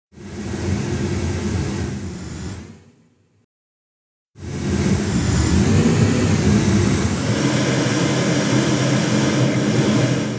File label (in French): exemple drums; physical music; time freezer